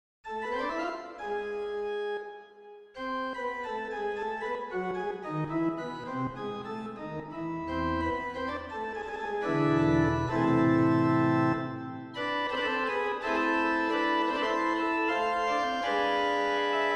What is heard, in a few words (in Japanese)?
bach P F, 前奏曲とフーガ・イ短調